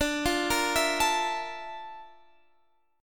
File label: A sharp Major Sharp 11th, D; arpeggiated